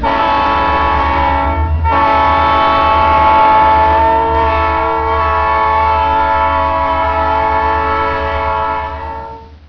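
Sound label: DIESAL, diesel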